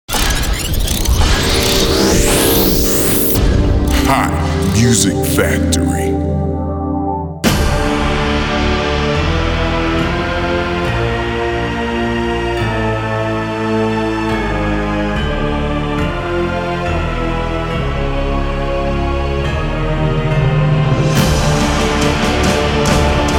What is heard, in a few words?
movie themes epic